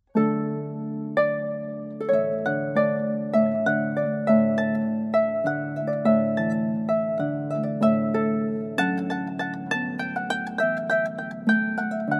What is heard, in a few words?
Water Music Hornpipe